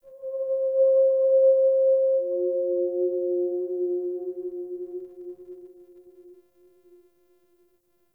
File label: Sentient Lair Texture Interior Small, Ambience; Warframe